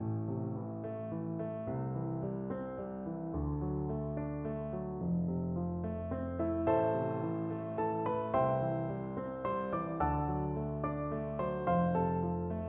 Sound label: house of the rising sun piano duet